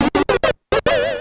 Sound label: wrong victory